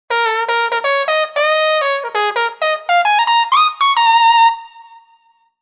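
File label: lead trumpet; TURBO VL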